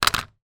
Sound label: household tv remote plastic down on table; zapsplat